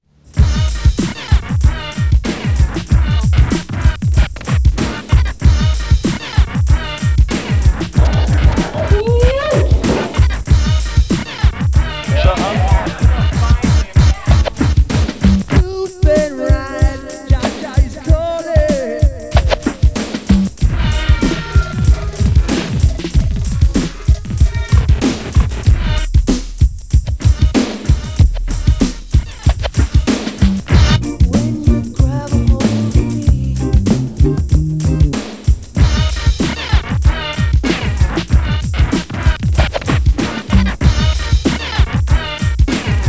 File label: Loop Dub